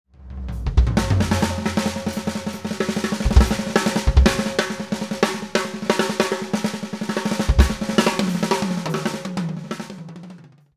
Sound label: Pearl BLX Birch, Snares